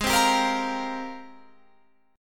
G sharp Major 13th, Guitar, Standard; strummed; capo=3

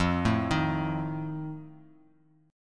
F 7th F down; F7 arpeggiated

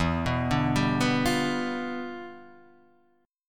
E Minor 7th Flat 9th E

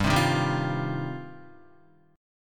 G 7th Suspended 4th Sharp 5th, Guitar

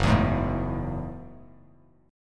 Gb Minor 9th, Bass Standard; strummed; JGuitar

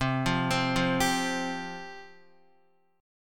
C Suspended 4th C, Guitar; arpeggiated

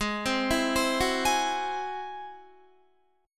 Ab Augmented 7th Ab down Guitar; Ab+7; arpeggiated; JGuitar

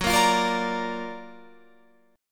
Gb Augmented Major 7th Gb down Guitar Open F; Gb+M7 strummed; JGuitar

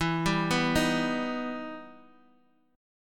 E Minor Major 7th, Guitar, Half Step Down

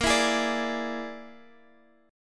E 7th Flat 5th; chord shape

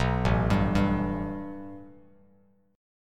A 7th Suspended 2nd Bass Standard; A7sus2 arpeggiated; JGuitar

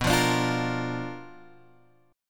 B Minor Major 7th Flat 5th Guitar Open G

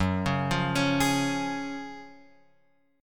F sharp Major 7th Suspended 2nd, F sharp, chord; F#M7sus2 Chord {2 4 3 X 2 4} arpeggiated

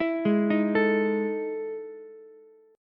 E Major 11th E down Ukulele C6; arpeggiated; JGuitar

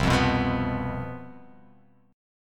C Major 7th Suspended 4th, down

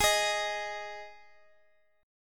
G sharp 5th G sharp

